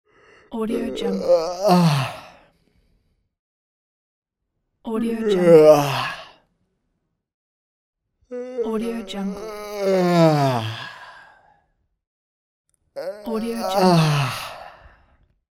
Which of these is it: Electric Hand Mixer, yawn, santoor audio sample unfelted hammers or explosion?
yawn